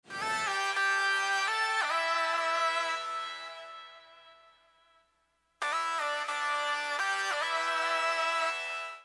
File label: synth; Hip Hop /R&B instrumental